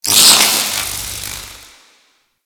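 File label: Infested Machine Spark; Warframe; Corpus Infested; Ambience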